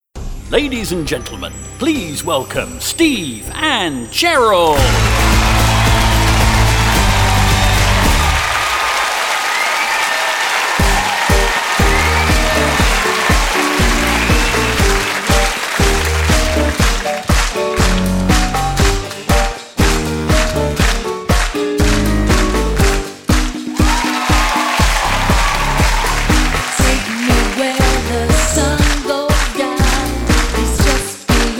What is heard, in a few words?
Singing Sample